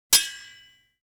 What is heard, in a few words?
sword hit single; fights bodyfalls; Asian Show Sound Effects